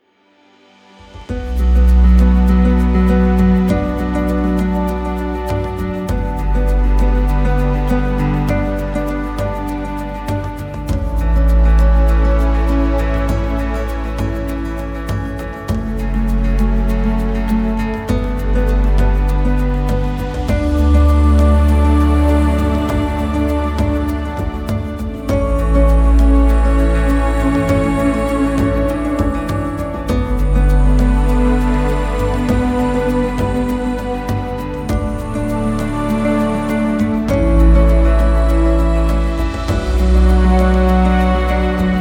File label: Elements Cine Mix Reduced Voc